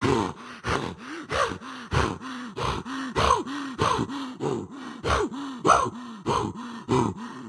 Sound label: Heavy Breathing; Caffiend Caelan